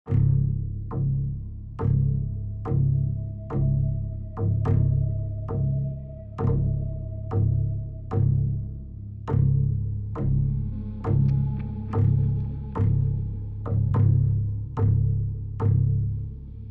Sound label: Tension Mood